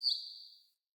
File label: Bird Single Chirp; Galene Bird